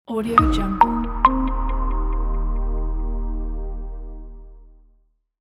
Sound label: marketing logo